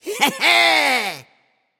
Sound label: heh heh; smite; raijin